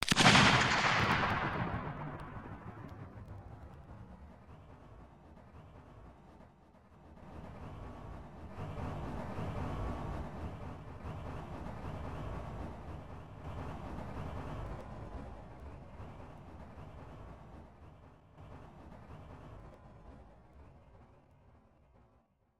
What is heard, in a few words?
explosion